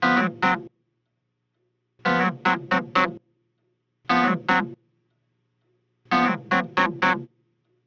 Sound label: Clavinet, 70s Analog Funk; Sonic Foundry (Sony Creative Software); What it Is! 70s Analog Funk